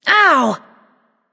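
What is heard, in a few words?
ouch, female; smite; voicepack; hera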